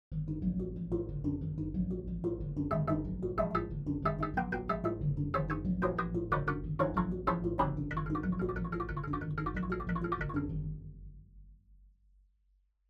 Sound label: pitch example finger dry